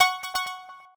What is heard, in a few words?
Menu Select